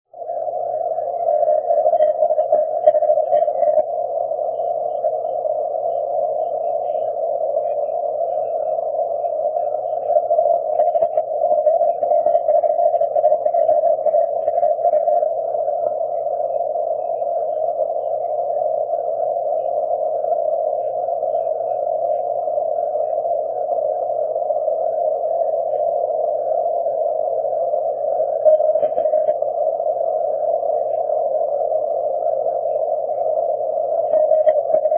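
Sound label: 20m continuous wave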